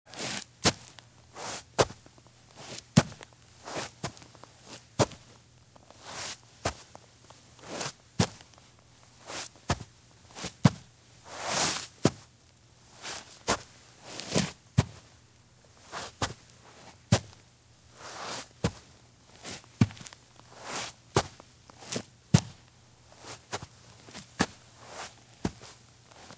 Women's Thin High Heel Shoes Do Various Scuffs on Grass; Sound Ideas; Footstep Sound Effects